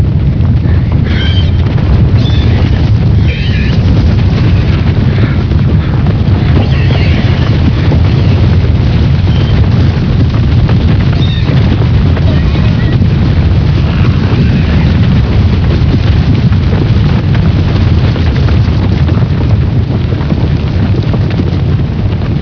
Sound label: DUCKS; Home-made